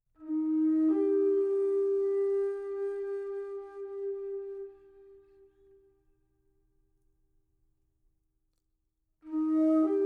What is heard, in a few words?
Meditative Music